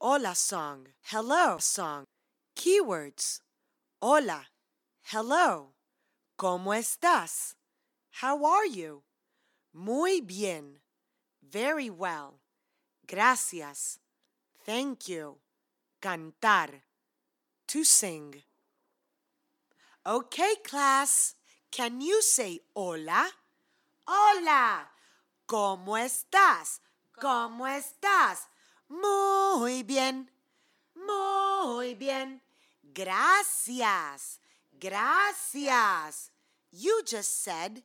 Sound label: Rituals Hello Song Spanish